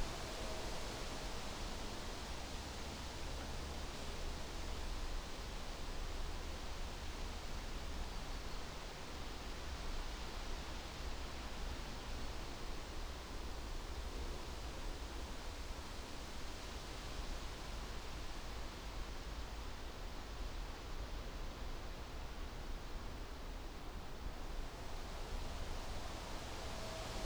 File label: ambience park